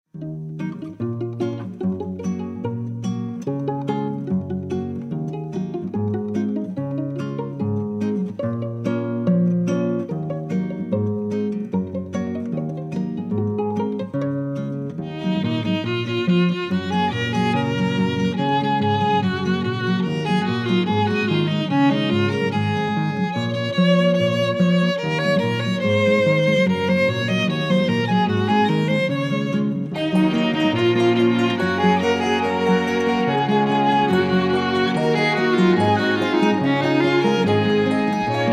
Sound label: instrume